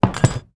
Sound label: Death Fall; portal quest; android; Rebel